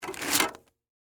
ATM Remove Cash, Industry, Atm, Remove, Cash, Fast; Machinery (Sound Effects:Machines)